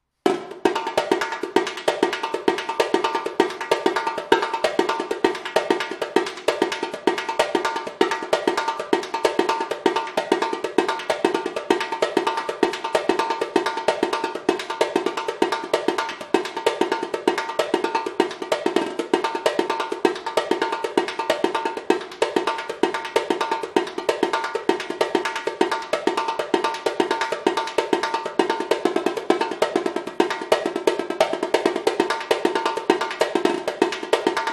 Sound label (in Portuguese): repinique; ivsom